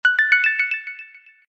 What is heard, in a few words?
notification; Etuline